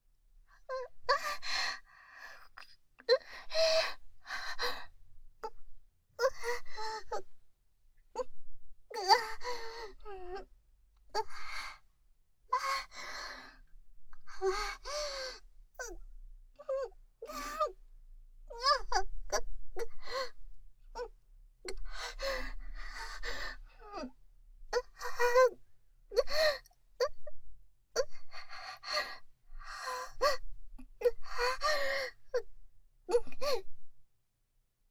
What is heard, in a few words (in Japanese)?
喘ぎ